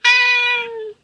cat sweet voice; animals

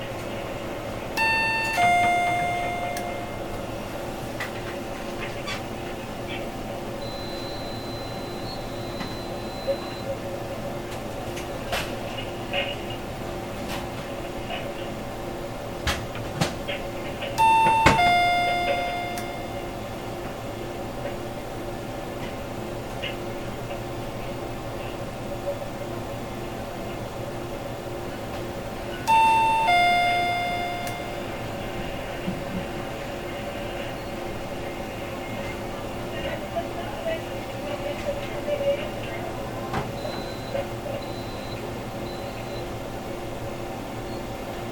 conveni office, environment

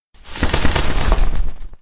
flapping wings; 羽ばたき１; animals

